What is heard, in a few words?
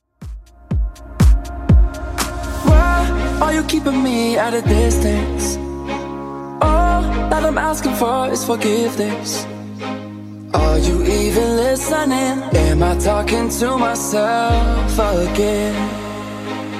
122bpm